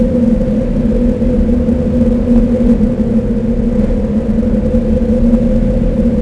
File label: cave wind; The Elder Scrolls III Morrowind GOTY; XBOX